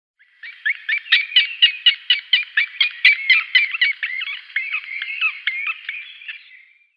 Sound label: Green Woodpecker nestlings